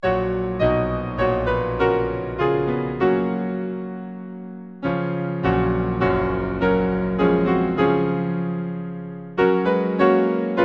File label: piano, Hymn; I love thy kingdom, Lord; St Thomas Williams